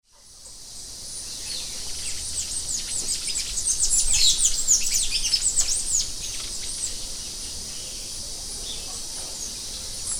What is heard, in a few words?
Tangara palmarum Sanhaçu do coqueiro